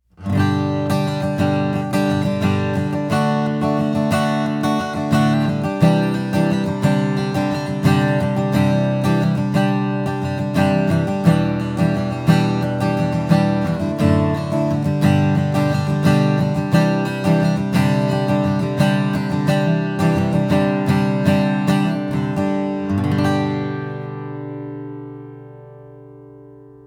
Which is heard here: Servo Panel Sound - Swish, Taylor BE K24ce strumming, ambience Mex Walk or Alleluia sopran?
Taylor BE K24ce strumming